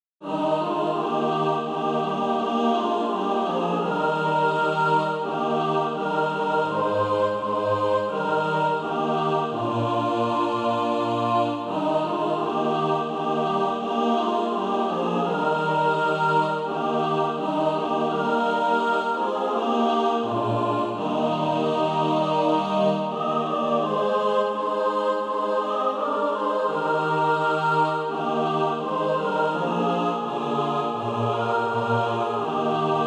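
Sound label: mixed, SATB Practice Music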